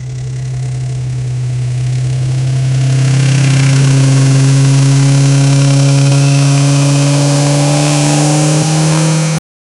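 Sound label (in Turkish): yüksek devirde hızlanan 600, motorcycles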